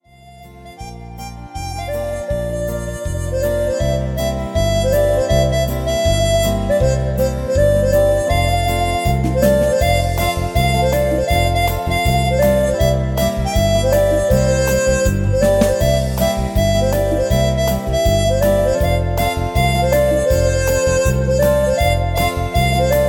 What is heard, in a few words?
MOUTHORG